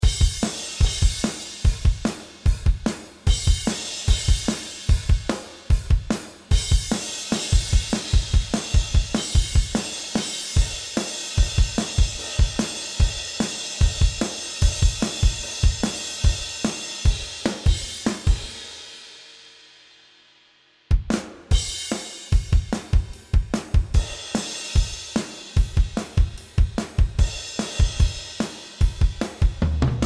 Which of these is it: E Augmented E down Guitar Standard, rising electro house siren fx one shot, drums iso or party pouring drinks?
drums iso